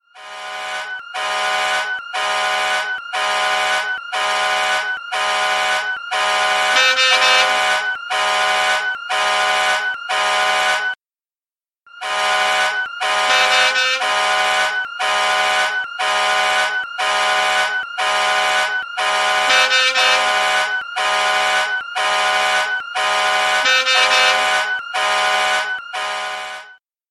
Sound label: Alarm Clock